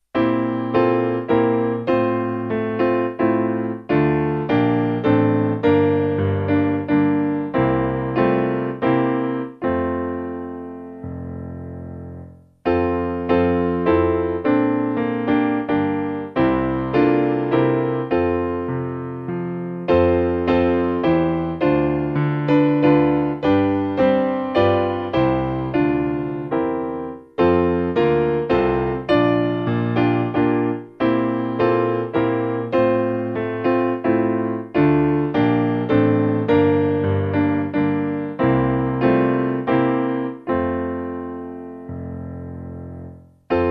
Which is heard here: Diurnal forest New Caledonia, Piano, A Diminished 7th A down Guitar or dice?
Piano